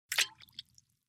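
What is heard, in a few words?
sounds of lemon or lime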